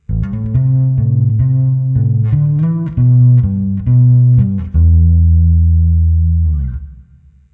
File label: Boss Katana Go Bass Clean Vibrato